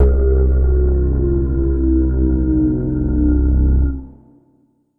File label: Synth Hits, C1; Zero-G; Soundclash Nu Dance Electro; Acidized